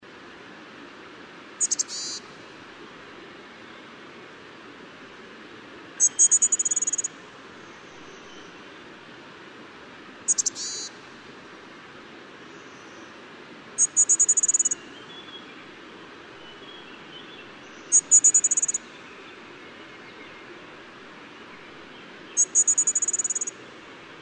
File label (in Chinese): 赤腹山雀; 雜色山雀; 山雀科/留鳥